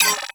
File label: Cipher Enter, UI